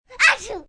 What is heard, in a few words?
Sneeze Kid Achoo, Sneeze, child, short; Sneezing